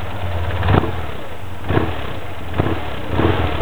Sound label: rich flip, Carburetor; Tune Up; Carburetor Air Cleaner; FCR; setting FCR